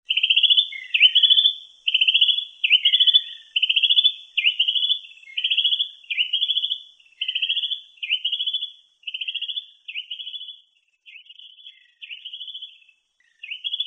kombinasi trill greyback

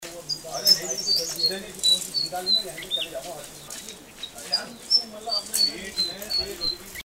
suara burung sikatan

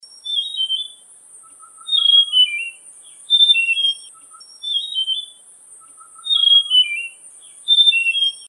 Suara sunda robin

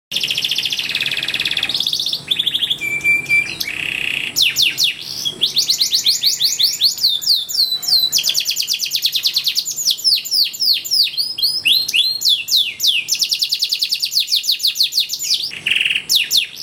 kenari; koboy jr

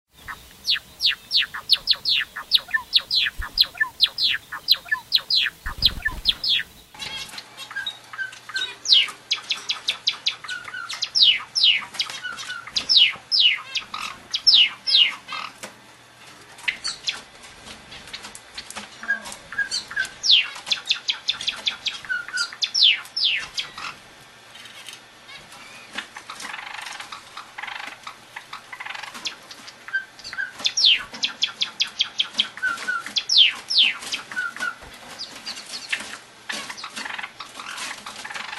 gelatik

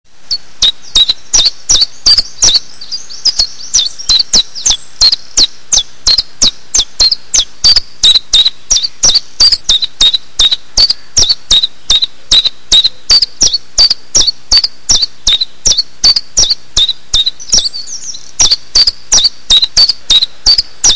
suara cabecabean

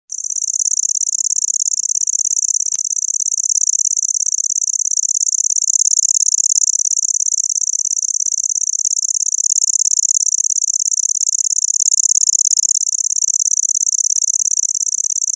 kecici lurik

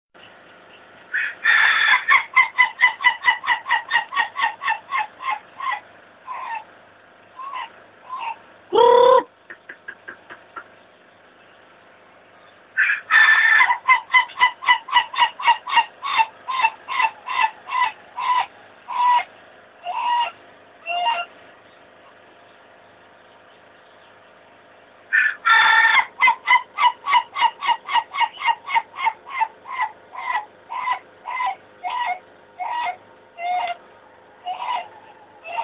jenis bunyi ayam ketawa suara dangdut slow crystal

